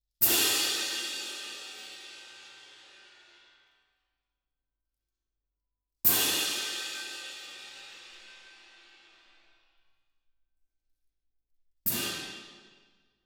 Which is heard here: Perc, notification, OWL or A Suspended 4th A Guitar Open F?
Perc